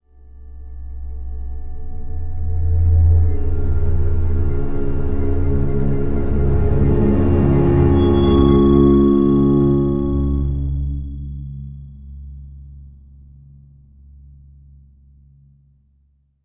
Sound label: sting Death; the walking dead michonne 2 give no shelter